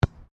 SOCCER BALL BOUNCE ASPHALT; Soccer ball sounds; Sports

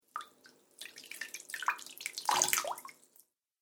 Water Trickles And Plops; Dripping Sound Effects

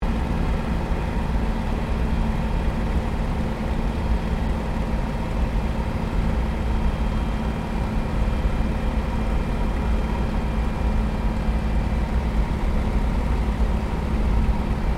AIRCRAFT P-40 KITTYHAWK LAND INTERIOR STEREO; P-40 Kittyhawk / Warhawk / Tomahawk; Airplanes

